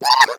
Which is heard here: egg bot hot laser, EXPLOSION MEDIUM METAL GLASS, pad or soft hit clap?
egg bot hot laser